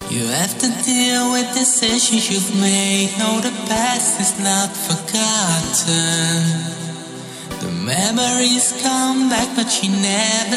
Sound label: Funky House Vibes